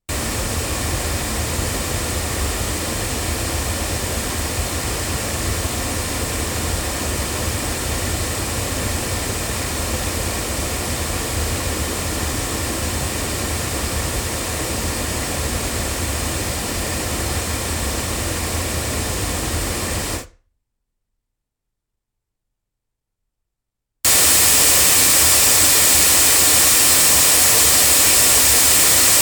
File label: MXL 600 loudspeakers